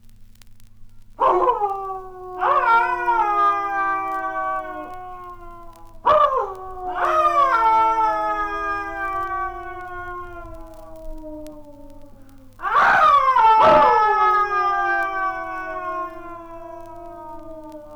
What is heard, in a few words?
werewolves howling; 3 werewolves howling